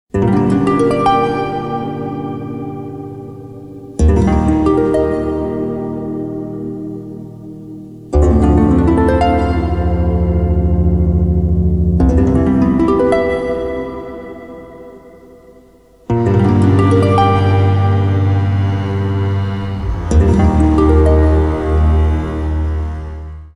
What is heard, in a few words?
Heaven Strings, minimal soundtrack